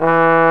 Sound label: TROMBONE; Trump TrombCombo